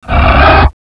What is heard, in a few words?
down; renesaur